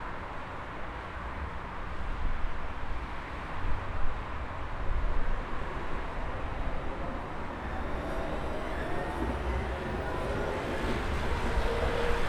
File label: Athens. Syggrou Avenue. High Traffic; George Karagioules; The Source. Ambiences from Athens